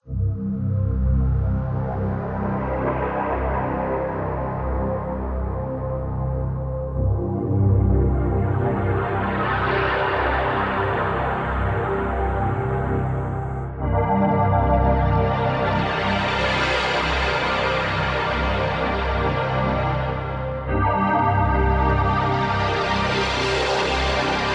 Mus Grasslands Stem; Epitasis

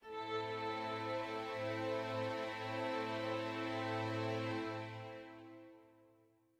dark med end

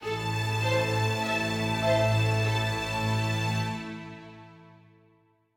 adventure forte end

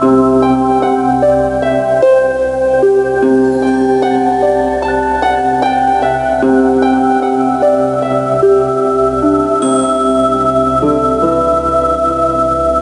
Loop; Junior Mystery Quest; NDS

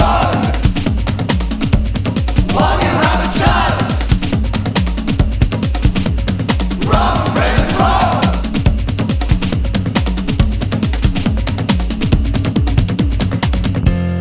Run Instrumental; Walt Disney's The Jungle Book: Rhythm n' Groove; PS1, PS2, Windows

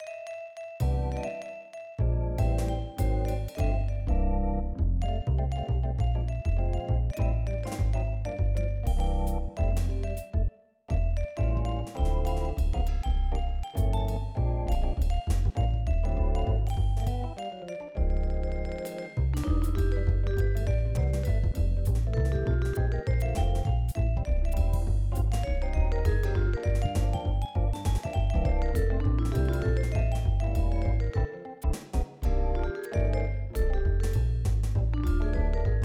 Jingle Bell; Snatcher; PS1

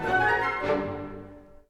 Music Dialogue Suspense End 50bpm

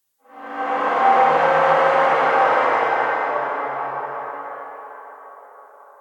Amb Canalisation Amb OneShot; Puddle

